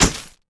bulb flash

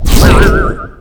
wierd worm spit

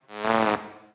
fly spawn; horizontalrunner

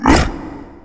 scythe swipe windup; death

